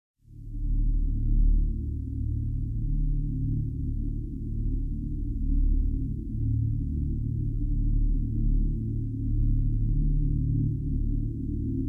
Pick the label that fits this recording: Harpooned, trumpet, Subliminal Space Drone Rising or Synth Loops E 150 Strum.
Subliminal Space Drone Rising